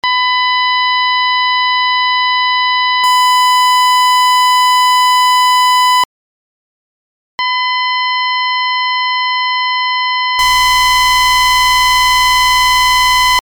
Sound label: five harmonics dispersion and distortion